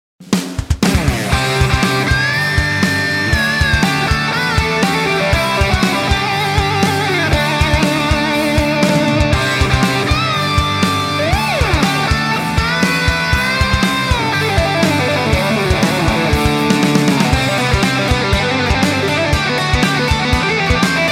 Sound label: Instrumental Rock; In the Style of Instrumental Rock Mixdown